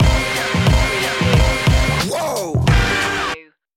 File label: boom bap stinger